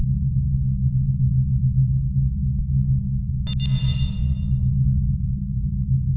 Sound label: drone machine